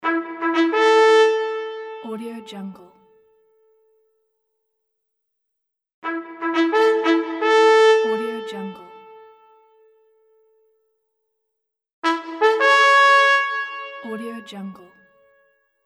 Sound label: military trumpet; mountainaudio